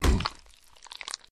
zombie hit

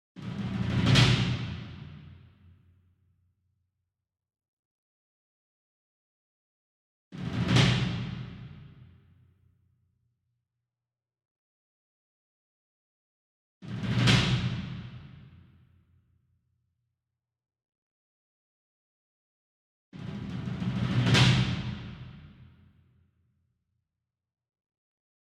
Bass Drum Marching Roll Hard Beater Far; SINGLE DRUM; boom library; Cinematic Strikes Construction Kit

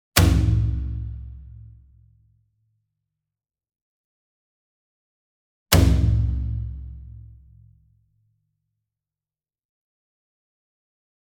Single Drum Taiko 28 Inch Hit Whip Beater Medium; boom library; Cinematic Strikes Construction Kit

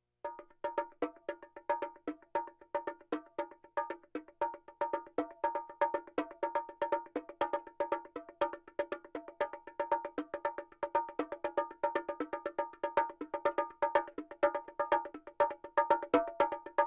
Percussion Bongos Tympani rolls Gongs Drums

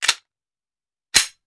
Hand Gun Foley; Sound Ideas; Guns

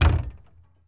Truck Explorer Hood Open; Trucks

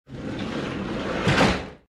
Door - Metal - Slide - Open; RadwinIsland

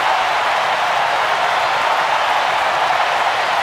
Hockey Crowd Cheer Loop; Crowds

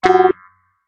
menu fail; firefight

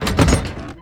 Coffin Metal Open; SFX Kit; Foley - Misc

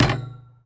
Street Ball Glass Back

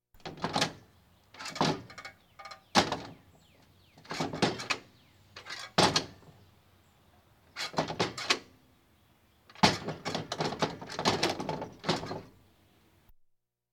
Police, Fire engines

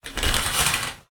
Shower Curtain Open, Bathroom; 1001 Sound Effects

